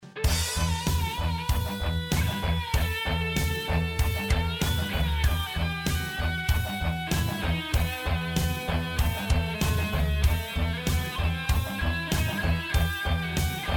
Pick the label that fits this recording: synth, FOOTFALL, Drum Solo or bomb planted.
bomb planted